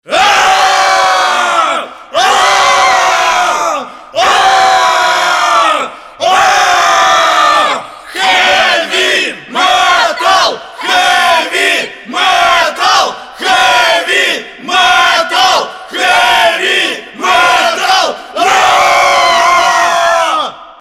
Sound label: Heavymetal